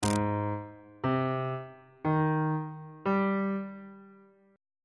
b flat mixolydian 7th chord 7 is a flat major 7th on bass clef